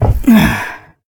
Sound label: fall, fox male